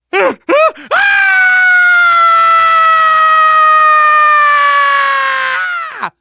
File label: fall, survivor voice